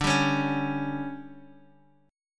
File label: D Major 7th Suspended 4th, Guitar, Open D